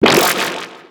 状態異常

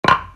ashioto geta

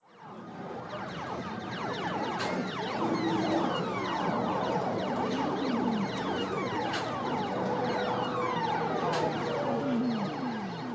アーケード背景音

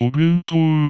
octave おべんとう

